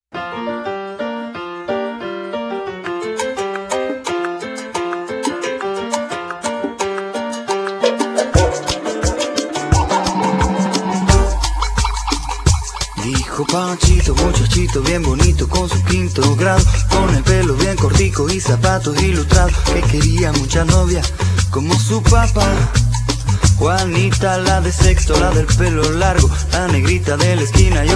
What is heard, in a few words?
folk